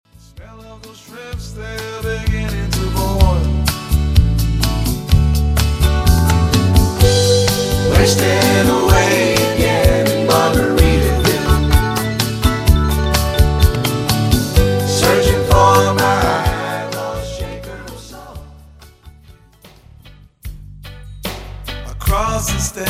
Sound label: Island Reggae Track